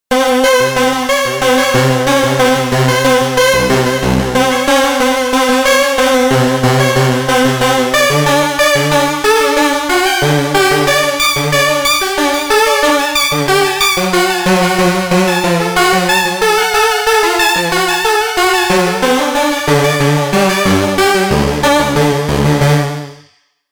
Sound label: Untuning